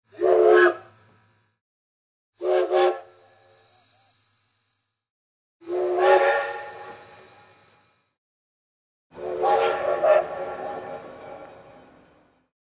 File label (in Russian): zvuk gudka parovoza